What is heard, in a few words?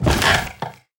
fs fm plywood, footsteps; Portal 2